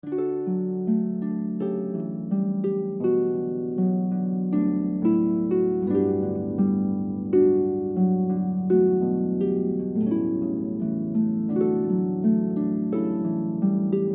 traditional Jewish, harp flute cello

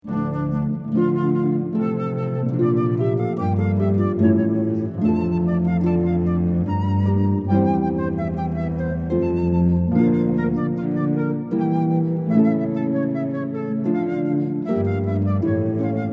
opera theme, harp flute cello

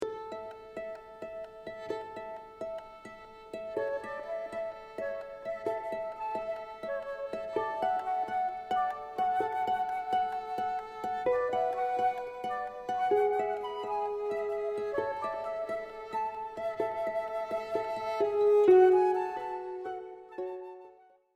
harp flute cello

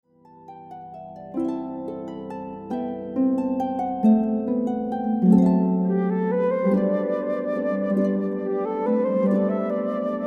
Soaring, harp flute cello

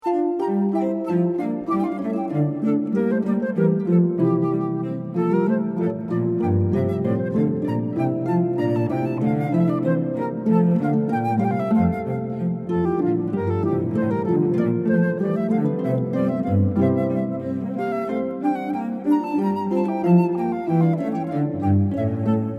Bouree, harp flute cello; Handel

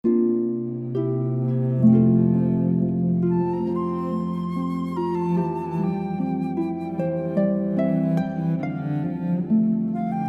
harp flute cello

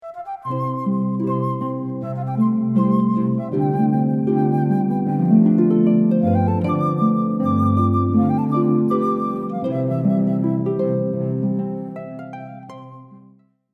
harp flute cello

